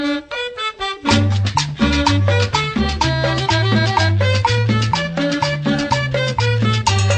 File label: funny sms xmas; ringtones